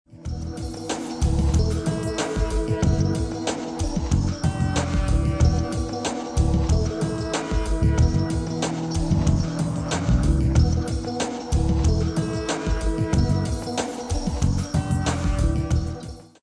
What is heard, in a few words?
Chords